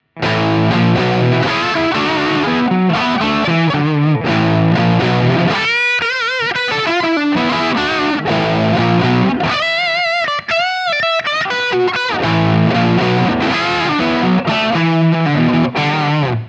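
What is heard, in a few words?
plexi drive bypass; plexi drive btpass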